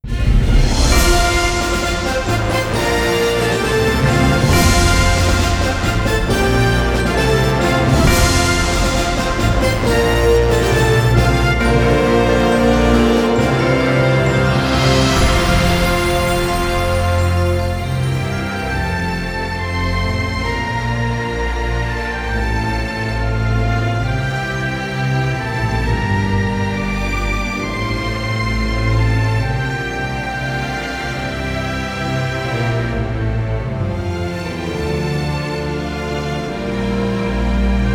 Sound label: victory; pearl harbor zero hour